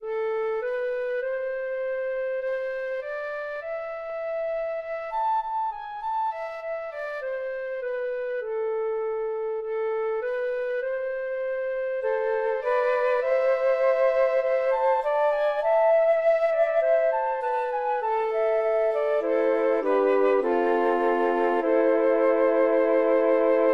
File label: Trio, Flute Trio